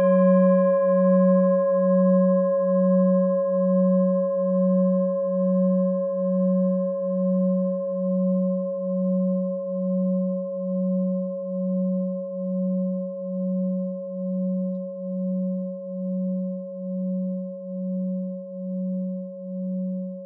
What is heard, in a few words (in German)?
klangschale set; Klangschale Nr.1; Gewicht = 1320g; Durchmesser = 22,7cm; Höhe = 9,6cm